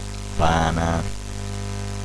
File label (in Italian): rana